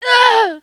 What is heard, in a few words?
woman hit; Mount&Blade Warband